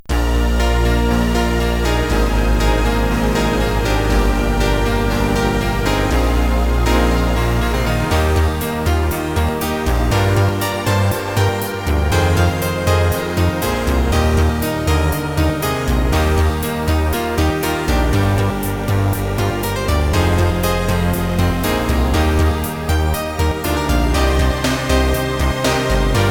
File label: rock piano; OctaMED SoundStudio